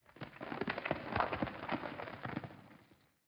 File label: Crawler Attack